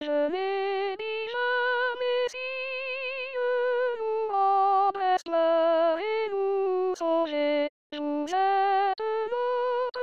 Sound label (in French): venez divin messie seule voix